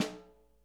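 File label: SNARE DRUM, JAZZDRUM; Ueberschall; Drum & Bass Flash Trackz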